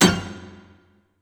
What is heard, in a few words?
Misc Stab